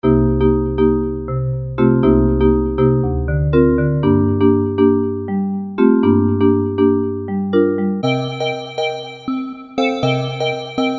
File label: Vibes; PSP